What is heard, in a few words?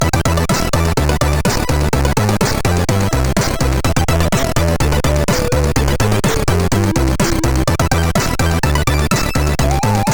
Test, CSG8580; hvsc